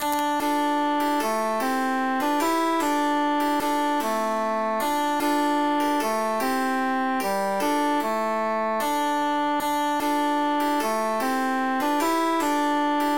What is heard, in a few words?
three country dances transposed bassus emphasized